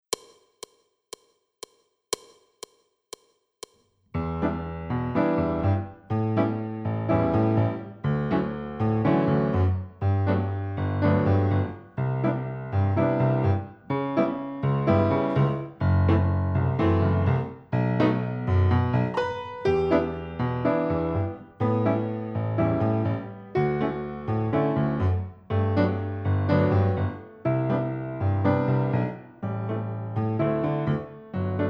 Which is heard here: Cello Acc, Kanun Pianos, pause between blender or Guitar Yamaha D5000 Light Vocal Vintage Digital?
Cello Acc